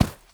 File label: horse footstep sand hard; Rust